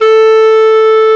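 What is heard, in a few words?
clarinet; CMI Sound Library Rev 1.3; REEDS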